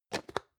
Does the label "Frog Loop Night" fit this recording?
No